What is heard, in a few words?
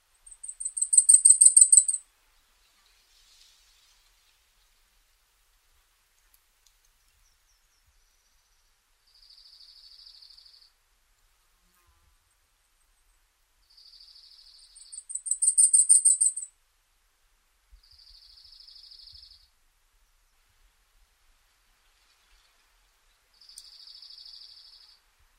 blackpoll